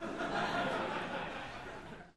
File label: Crowd 100 Short Medium, medium int